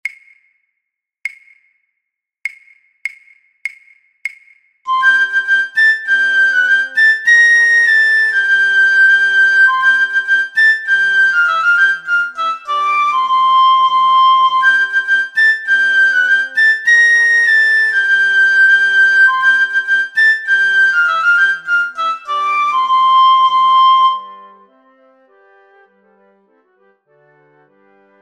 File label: piccolo